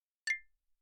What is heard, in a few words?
Cartoon Blink Xylophone